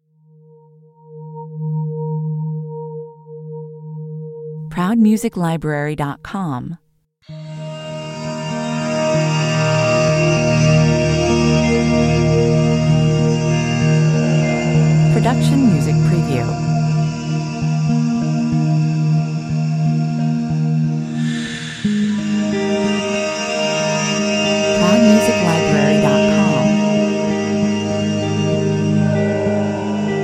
Ambient piece with live electric guitar, synth pads and me singing. Its very warm, welcoming, pos, 65 bpm; Its very warm, welcoming, positive, dreamy... heaven.